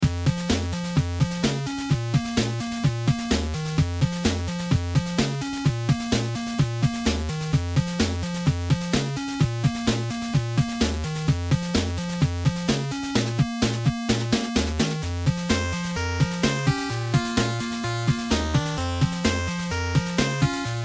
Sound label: beat